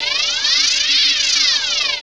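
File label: Pad Synth; Equipped Music; Loungin House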